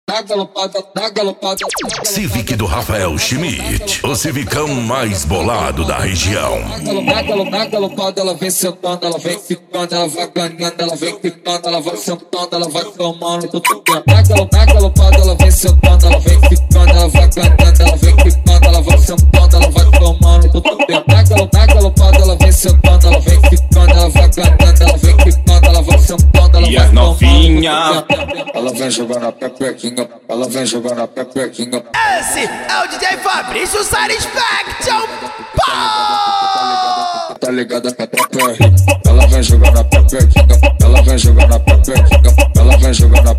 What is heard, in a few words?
funk mega bass